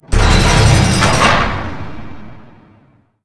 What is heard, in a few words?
overhead slider open; hl2 ep2; ambient, levels, outland